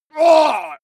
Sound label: male fight moan painful; soundpool